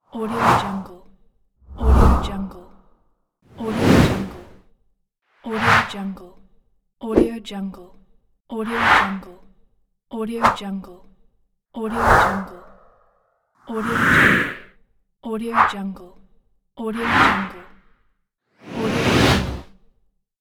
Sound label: whoosh